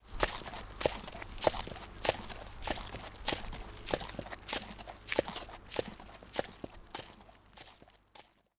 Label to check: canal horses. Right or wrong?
Right